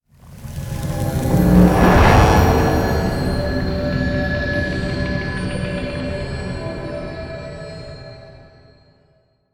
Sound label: world relic show planet; ancient relics